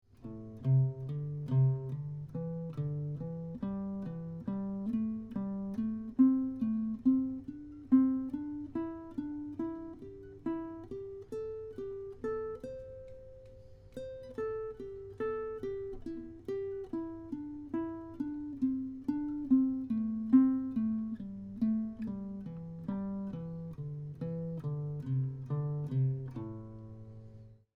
Consecutive 5 note pattern, minor pentatonic scales on guitar; Consecutive 3 note pattern; shape 1 of the A minor pentatonic scale